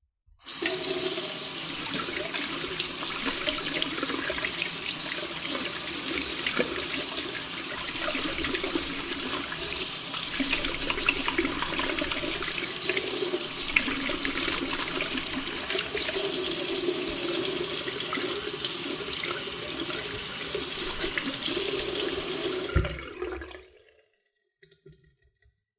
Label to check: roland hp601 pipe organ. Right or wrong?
Wrong